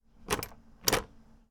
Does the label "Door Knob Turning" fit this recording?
Yes